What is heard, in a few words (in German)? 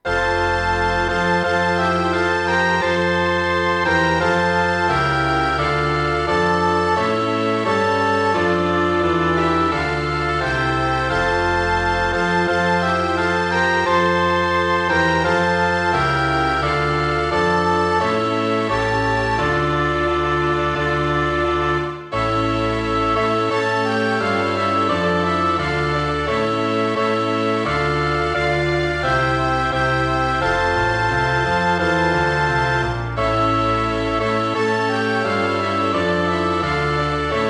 nationalhymne ukraine kammerorchester